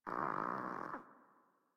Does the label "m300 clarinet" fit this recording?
No